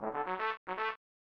Tada!; fun n games 1994 snes

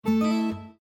Afternoon Hardware Insert, windows 7

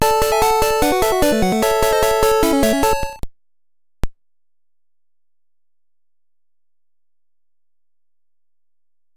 Ending; Level 9, High 4; Tetris; GB

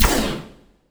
Rail Gun

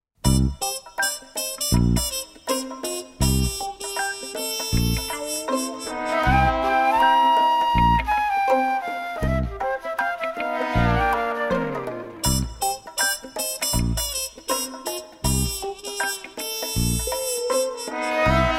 EXTRICATION Instrumental Version; lost universe

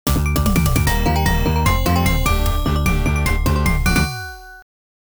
3rd Period Start, arcade; Face Off; Namco System 1 arcade

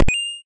Coin; Brock; Syobon Action Halloween Edition; Android

